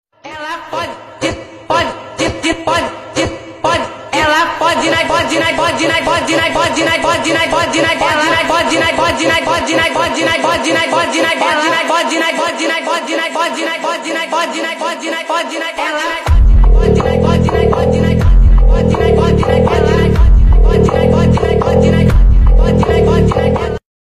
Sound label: beat